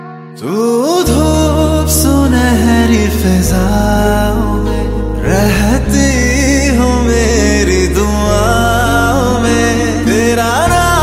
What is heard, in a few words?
New Romantic Hindi Song Ringtone